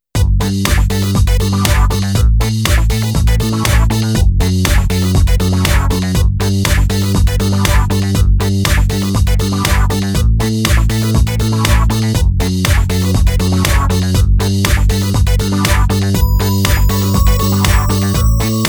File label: Disco; Fashion Show Electronica